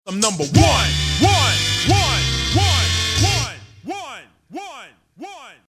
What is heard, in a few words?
Vocal One